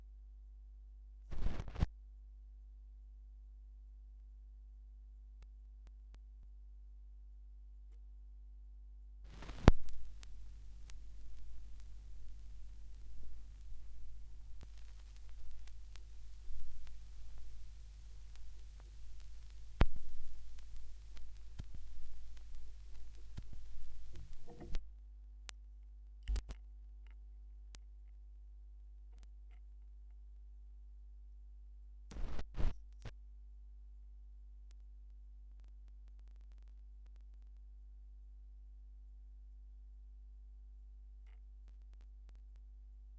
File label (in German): Schallplattengeraeusche; rauschpartikel